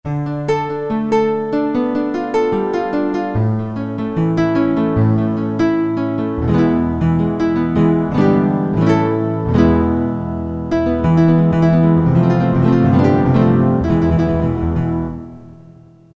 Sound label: Guitar, Roland MT-32